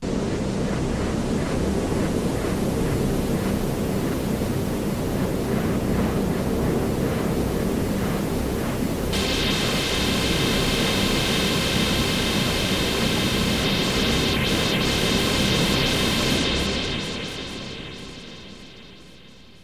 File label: Panning Bed, Sound FX